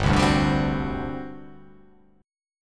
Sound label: G Major 7th Suspended 4th, G, down; GM7sus4 strummed